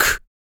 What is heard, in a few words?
SNARE, Male Perc, Vocal; Spectrasonics Vocal Planet; Jazz FX